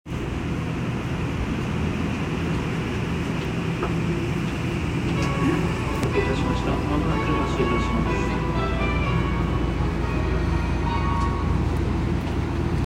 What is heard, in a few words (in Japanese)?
南北線飯田橋北, train sounds